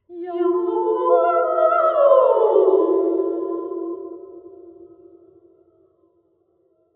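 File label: slinky impulse response sing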